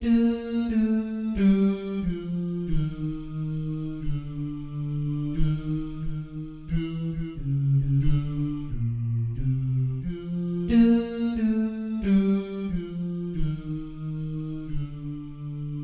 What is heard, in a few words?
All Night All Day bass